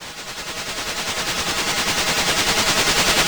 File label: old train